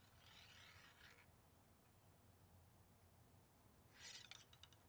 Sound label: archery foley arrow pullback